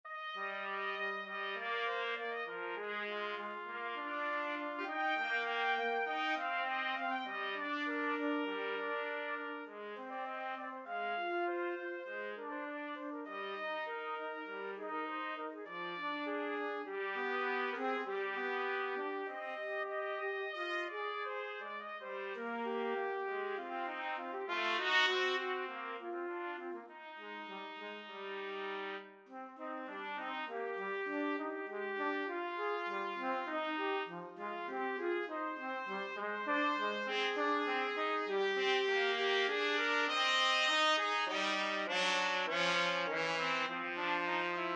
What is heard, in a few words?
chanson de matin, trumpet; trumpet duet